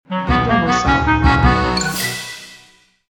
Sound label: Playful Snippet